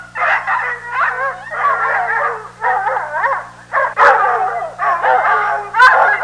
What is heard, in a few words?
Bloodhound pack barking